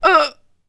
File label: death; Alien Arena; cybergirl